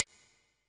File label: snap, Yamaha Tenori-On Snapkit